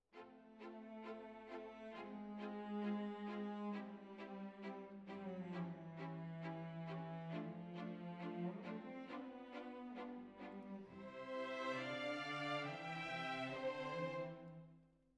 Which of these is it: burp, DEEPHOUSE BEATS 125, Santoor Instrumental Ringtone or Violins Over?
Violins Over